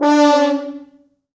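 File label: French Horns; 4 FrHorns FXMOD; East West - Quantum Leap Horns Sax; 90's Sample CDs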